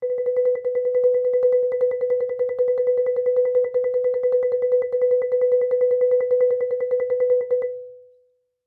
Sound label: Marimba roll ff B4; MIS Pitches - 2014; Percussion